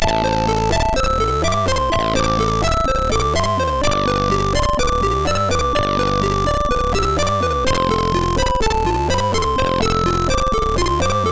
Lazyness end, CSG8580R5; hvsc

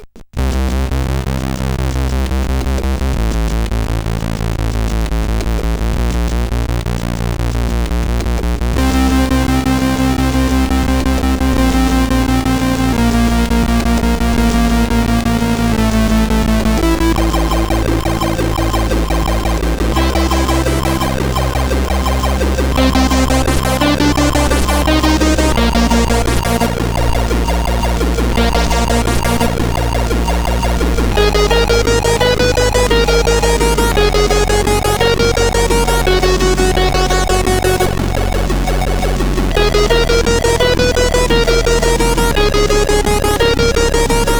Intro, CSG8580R5

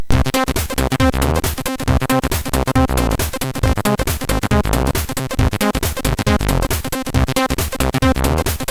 Metal Crack, MOS6581R3; hvsc

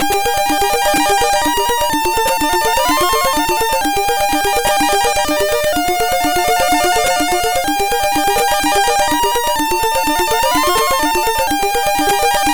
Slow Arpeggios, MOS6581R3

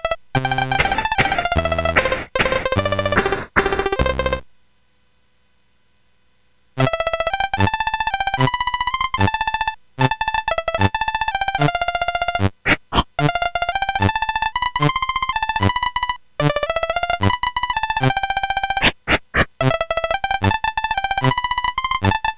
Ducks Ahoy, CSG8580R5

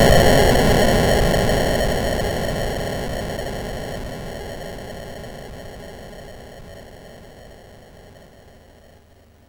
Frantic, MOS6581R4

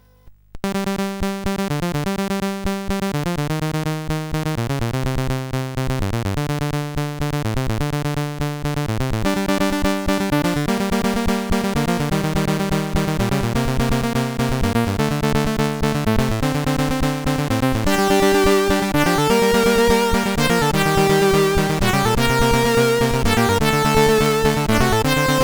Loader Remix, CSG8580R5 sid

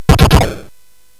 SFX; Narco Police; hvsc

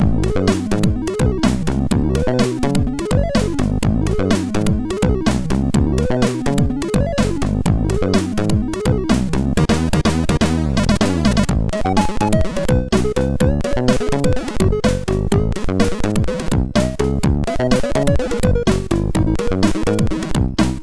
Synthfunk, MOS6581R2

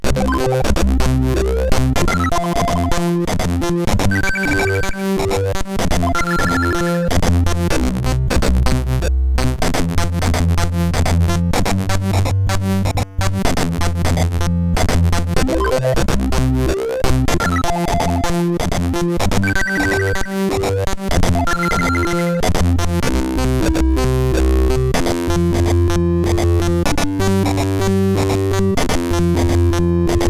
A Happy Gametune, CSG8580R5

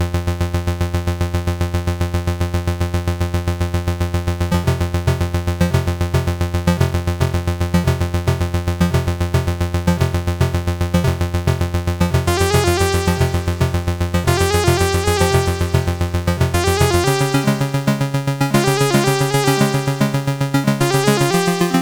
Synth Sample, sid CSG8580R5

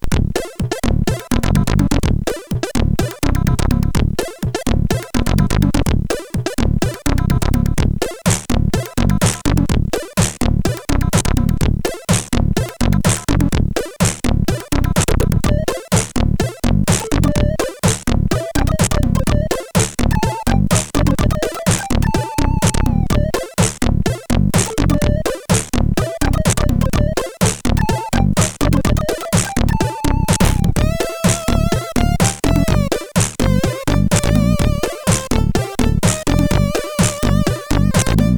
tune, sid CSG8580R5; hvsc

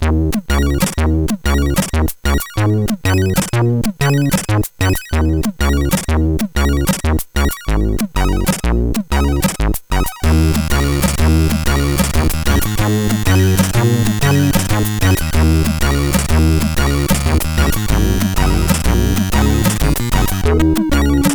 Title Tune, sid, 8580

